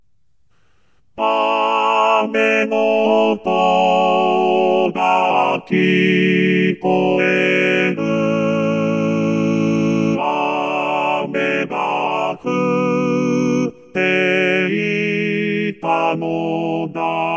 ame chorus v

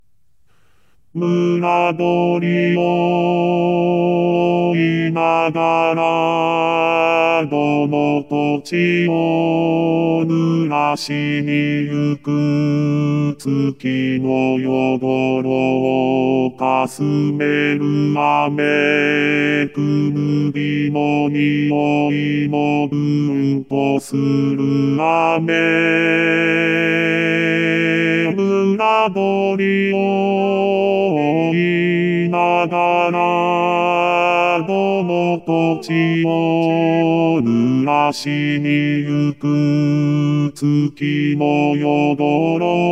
musashino bari v

